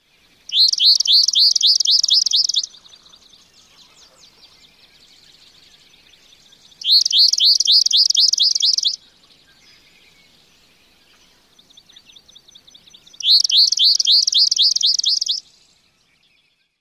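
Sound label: swamp sparrow odd buzzy song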